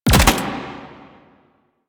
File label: Archgun Mech; Warframe; Archwing; Weapons